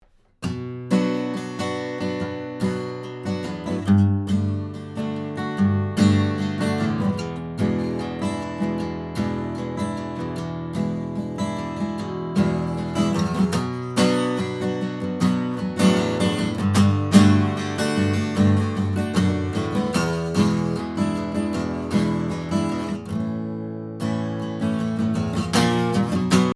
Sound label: acoustic